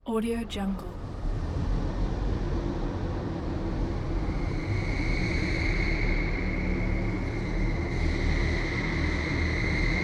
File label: car park atmosphere